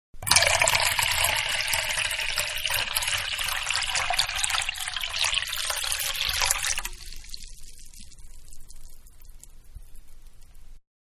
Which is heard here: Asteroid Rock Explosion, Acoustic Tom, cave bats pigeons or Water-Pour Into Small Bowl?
Water-Pour Into Small Bowl